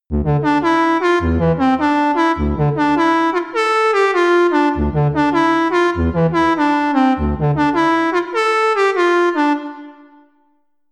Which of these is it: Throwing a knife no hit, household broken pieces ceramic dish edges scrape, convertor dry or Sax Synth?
Sax Synth